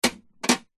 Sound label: industrial wrench spanner metal small set down on metal tool box; zapsplat